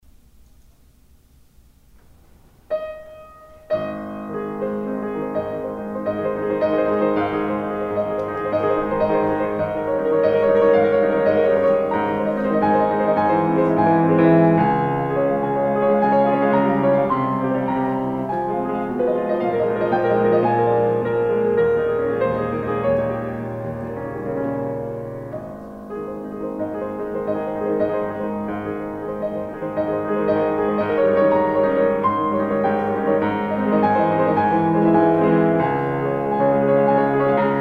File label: Etude in A-flat